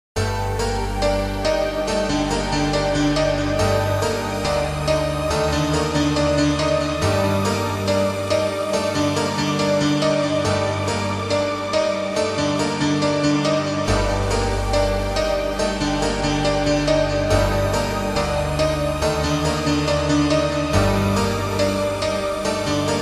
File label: Sinister